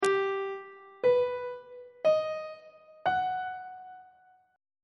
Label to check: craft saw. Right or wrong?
Wrong